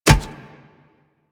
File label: Kubrow Step Metal, Footsteps; Enemies; Warframe